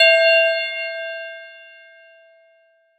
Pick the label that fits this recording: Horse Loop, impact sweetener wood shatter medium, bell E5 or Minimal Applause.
bell E5